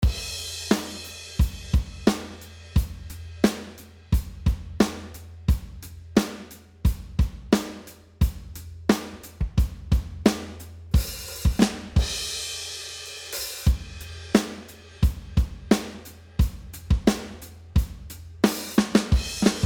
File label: drums dry audio; waves J37